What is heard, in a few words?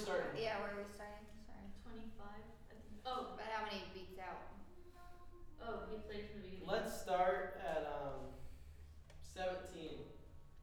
Cello